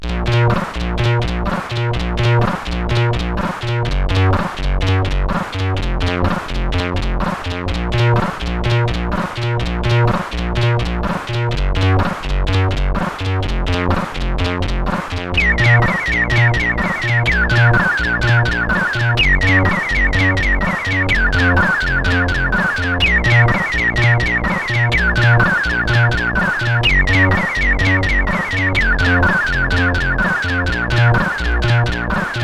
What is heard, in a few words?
Stupid Birds sid CSG8580